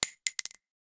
brass, weapons

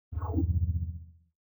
damage blocked by shield

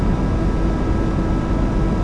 fan, ambient

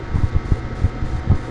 machinery drone, ambient

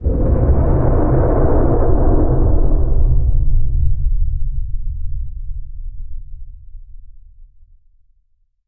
powerup

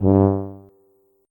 tuba note

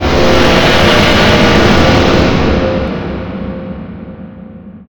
powerup shield

